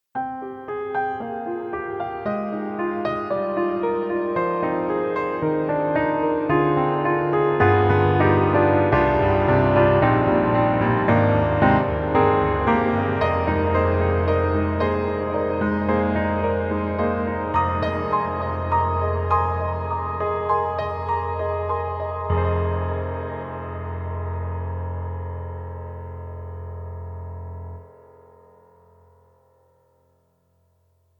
Pearl concert grand, sparse dreamy; IMPACT SOUNDWORKS

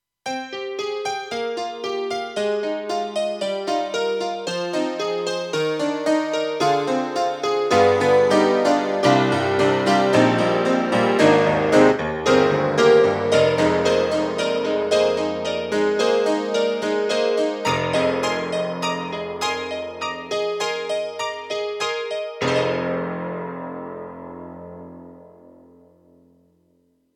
AKAI SG01p HONKY GRAND

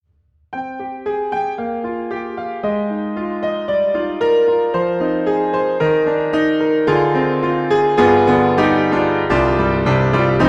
Out of Tune, piano; MODARTT S.A.S.; Pianoteq; D4

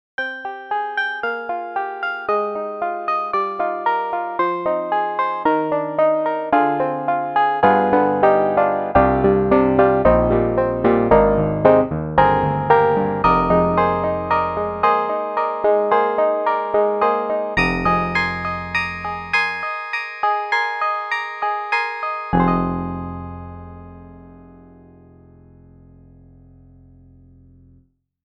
FM Double Grand; Steinberg HALion 7

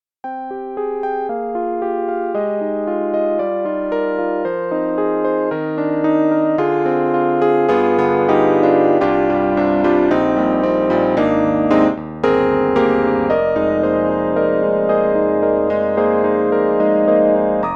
Yamaha CP80, Tremolo, Medium Tremolo; Steinberg; The Grand 3

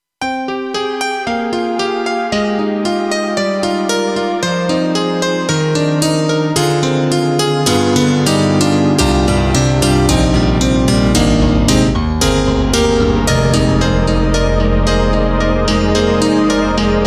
KORG M3 XPanded M1 and Pad

